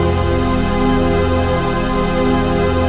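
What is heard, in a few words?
chord pad, Pads; Best Service; XX Large - Pads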